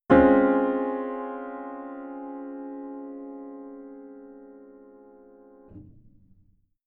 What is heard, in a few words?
piano Note; Wiwi Digital music technology